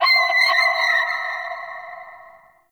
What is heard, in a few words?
Corrupted Beach Seagull Night; Ambience